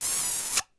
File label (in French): bisous